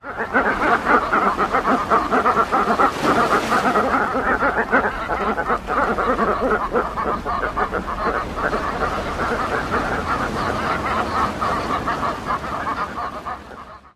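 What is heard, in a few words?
Brown Booby social calls; AFR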